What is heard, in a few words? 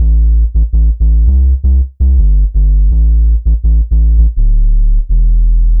165bpm Dm, Bassloops; Drum Bass; Frontline; Zero-G; Total Drum Bass